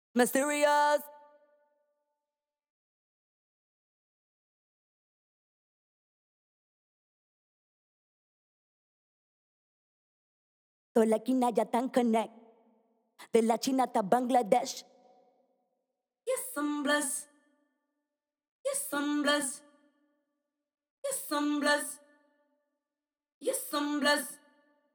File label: Vox Dub; Mysterious